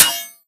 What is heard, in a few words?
bullet light ballistic blades deflection; Titanfall 2